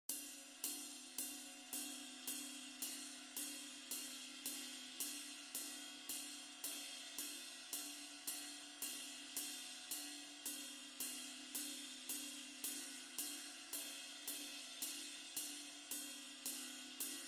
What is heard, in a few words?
Kookaburra Song BGM Symbals